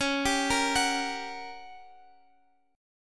G Minor Major 7th Flat 5th C sharp

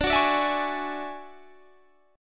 D Major Sharp 11th D, chord; DM#11 strummed

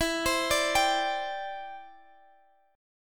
E Minor 7th Sharp 5th, Guitar, Half Step Down

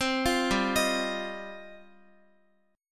G 7th Suspended 4th Sharp 5th G down Guitar; Listen to G7sus4#5 arpeggiated; G7sus4#5 Chord

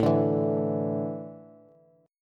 A Suspended 4th down Bass; Asus4; strummed; JGuitar

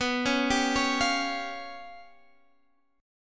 G 7th Flat 5th

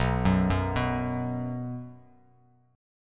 B 5th B down Bass Standard; arpeggiated; JGuitar

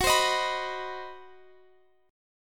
C Minor Major 7th Flat 5th, F sharp, chord

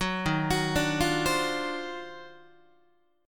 Eb Minor Major 13th, Guitar; EbmM13; arpeggiated; JGuitar

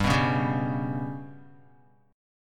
G Major Flat 5th, down, Guitar; GMb5 strummed; JGuitar

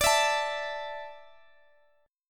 A 5th D, guitar chord; A5/D strummed

